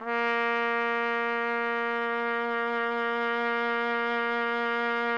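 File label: TPT, trumpet; Roland - LA Composer vol. 3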